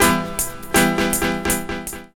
echo, ragga samples; AMG - Now CD-ROM (Roland); Soun'Bwoy!

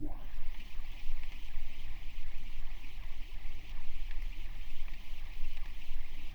Processed Noise; layering

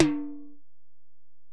Tom, Alesis DM-PRO

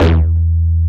DR. LOOP, BASS; X-Static Goldmine 3

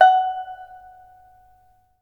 PIPA F#6; Spectrasonics; Heart of Asia

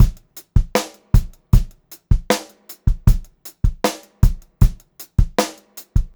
78 STR BEAT, Funk-Groove Drumloops; USB Soundscan vol.38; AKAI

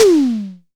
Disco Tom - Long; Tama TS-206

